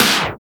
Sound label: SNR STOP LONG, TAPESTOPS; Drums In Your FACE!